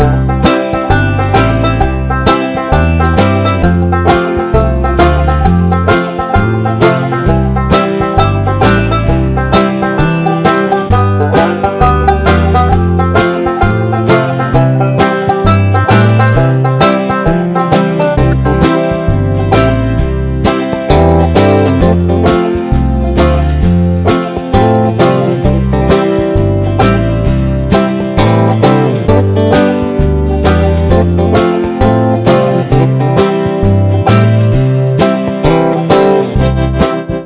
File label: country